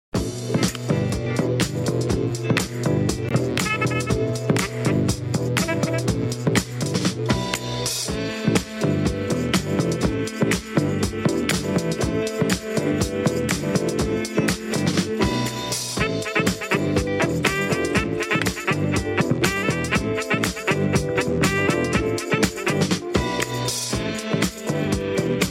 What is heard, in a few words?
sax mix